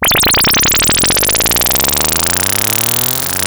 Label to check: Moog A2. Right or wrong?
Right